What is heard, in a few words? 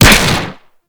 Fire 2D Shot, Rifles; Warframe; Weapons; Snipetron Vandal